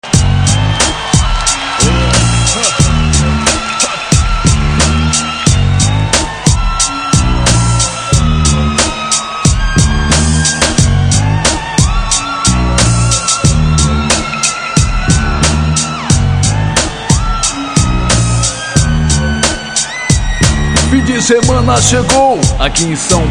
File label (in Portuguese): rap ao vivo; sampler rap